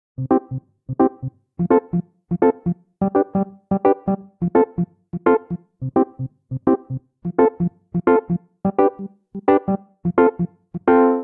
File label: Progression Reggae Upbeat Bubble I - IV - V - IV C Major 85 bpm, Electric Keyboards; Ableton; Factory Packs